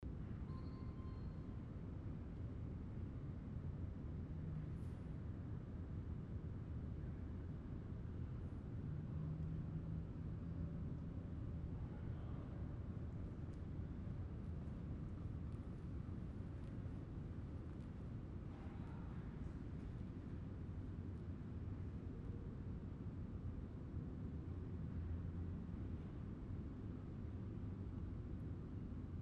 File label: Library Ambience